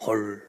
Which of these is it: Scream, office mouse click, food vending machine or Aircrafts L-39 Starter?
Scream